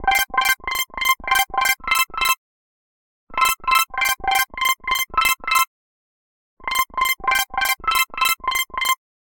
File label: ringtone; Google; Android Open Source Project